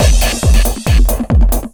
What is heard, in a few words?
138, Techno, drum loops